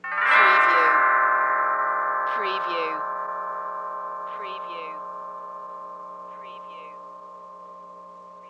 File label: Glissando Bell Creepy Ascend